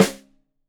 Rim Shot Stereo, drums

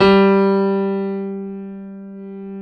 PIA PRO, Roland; Sound & Vision; Gigapack I CD 2; PIA GRAND 1; PIA Grand 3

